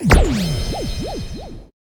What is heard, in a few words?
START; itgmania; themekit-piu